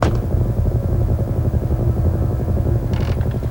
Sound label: kopter; Sonic Foundry (Sony Creative Software); Ugly Remnants VOL-2; UR 2 FX (Long)